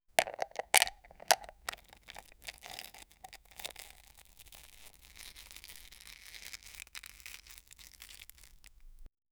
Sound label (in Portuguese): abrindo pote de nutella, studio